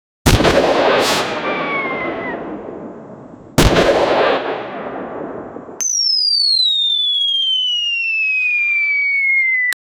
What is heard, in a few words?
tons of cartoony huge fun, holiday